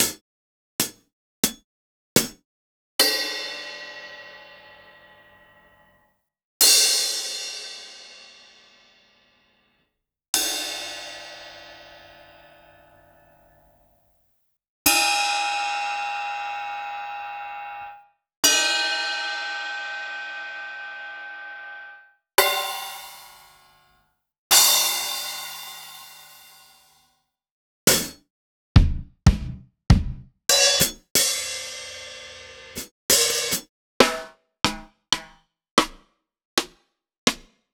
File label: Acoustic, Kit; Sliced